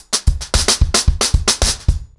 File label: 110BPM, acoustic drums, drumloops